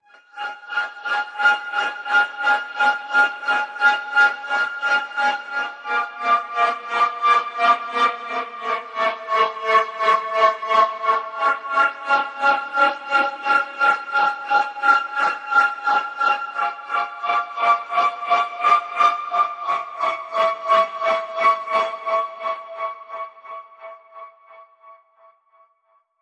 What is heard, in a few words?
OMINOUS AMBIENCE F 175